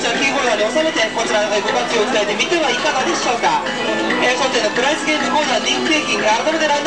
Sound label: ARCADE; Interactive Media Design Review 1999; tokyo